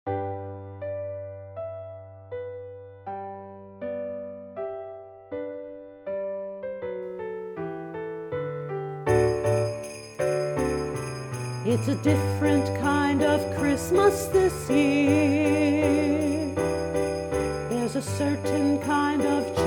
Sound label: Chords, Piano, Optional Sleigh Bells (Vocal Solos, Unison Choir); christmas